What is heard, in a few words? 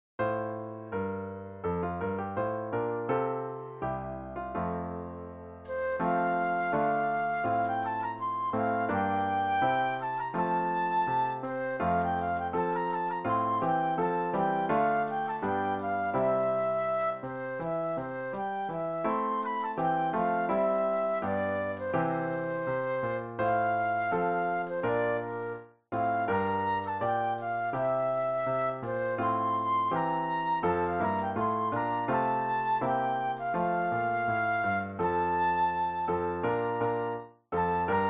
flute solo; patriotic